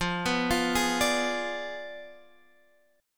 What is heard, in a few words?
F Diminished 7th F down; arpeggiated